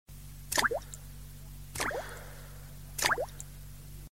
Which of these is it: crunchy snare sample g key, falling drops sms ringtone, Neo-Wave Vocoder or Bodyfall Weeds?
falling drops sms ringtone